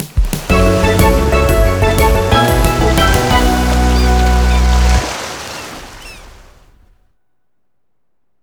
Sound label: Jingle Gameover; maimai dx splash plus arcade gamerip 2021